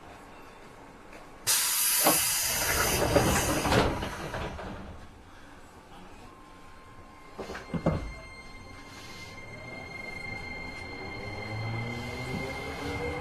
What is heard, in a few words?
musashino 205, train